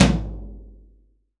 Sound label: roomy tom sound g key, Loudest frequency: 1159Hz; tom drum samples